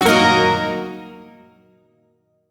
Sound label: Shawzin Yo Chord C; Warframe; Duviri